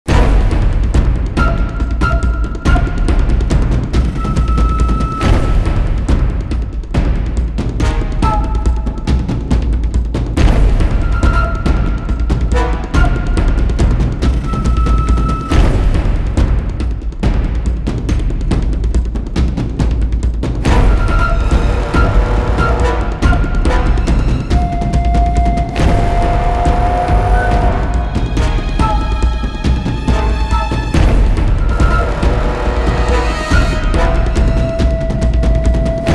Dark Tension; Call of Juarez

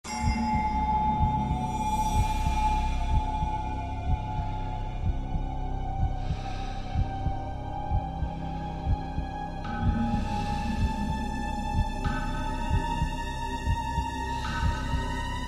slaughterhouse music; painkiller overdose

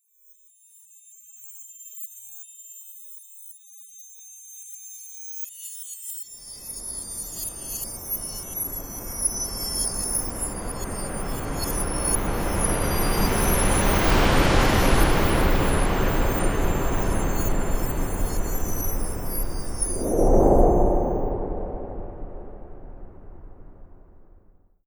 Rain; Ruins End Symbol Rain Quad

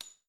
9mm Hit; Red Hot Vengeance

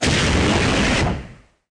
Weapon Flamethrower

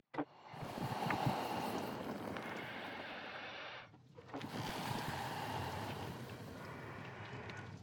furnace Blow; out of reach treasure royale 2020 gamerip pc

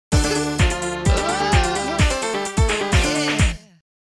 Strike & Double Jingle

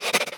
breath; Scrat

